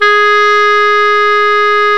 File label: Clarinets